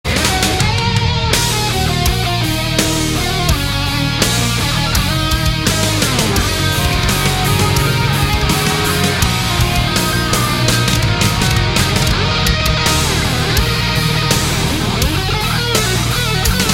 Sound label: Solo